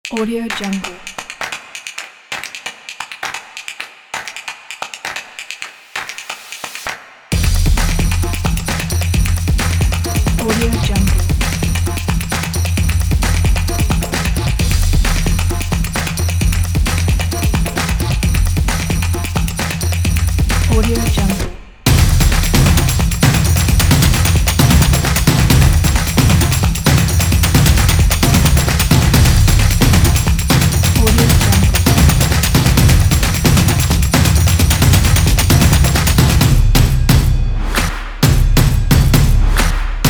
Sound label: the percussion; stereo-color